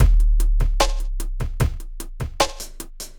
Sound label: hiphop